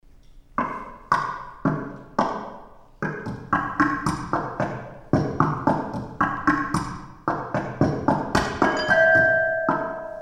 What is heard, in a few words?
Little Knocking Piece